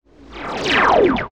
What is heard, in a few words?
Sci-fi Laser Gun Blast